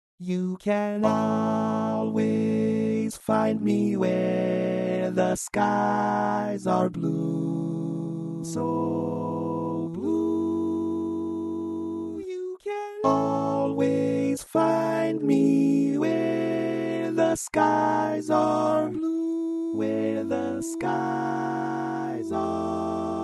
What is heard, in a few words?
Lead